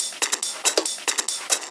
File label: Loops 140 BPM Hihat Percussion Loops